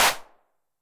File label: HAND CLAP, 909 Filter